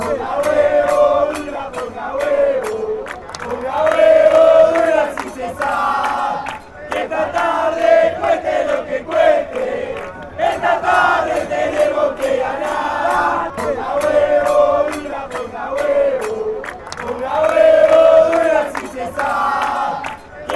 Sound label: douglas haig chant